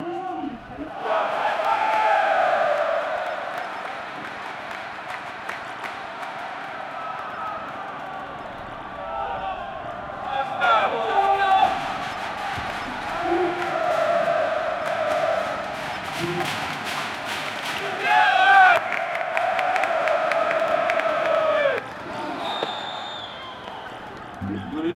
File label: bangladeshi stadium on a